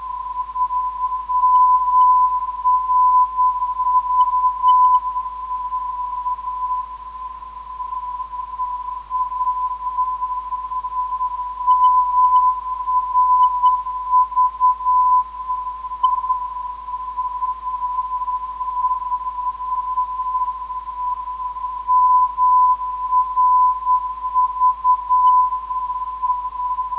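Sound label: mrv 404khz, non-directional beacon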